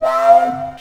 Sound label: VFX, VOX ALIEN; Best Service ProSamples vol.49 - FX-Area; AKAI